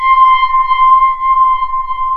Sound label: WATERPHONE, AX-60; Rubber Chicken Software Sample Bank